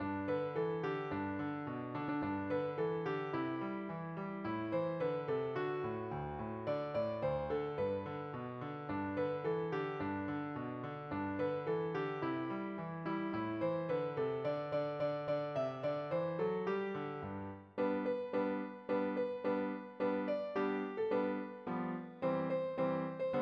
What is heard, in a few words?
jingle